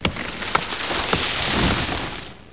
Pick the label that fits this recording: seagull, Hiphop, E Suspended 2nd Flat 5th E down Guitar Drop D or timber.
timber